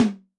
Dry2 Hi, Tom, Yamaha DTXpress-II

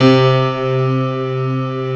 MIDI PNO, PIANO; East West; Global Sounds

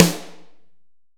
HALL SN, HALL SNARE

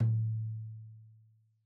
Tom2 Stereo, Deeply Sampled Drums

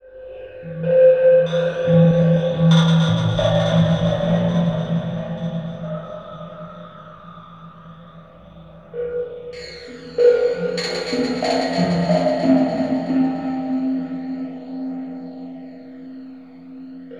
CHASM; Zero G; Malice In Wonderland

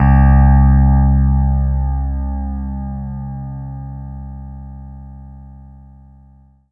STONE G3; Greytsounds; Scott Peers Sound Engineering

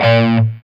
SOLDANO ROCK, Guitars; Spectrasonics; Hans Zimmer Guitars VOL-1

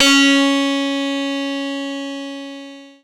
C#, Synclav FM PLUCKED; Big Fish Audio

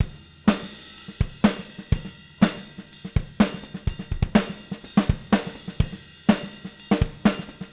124, Living Drums

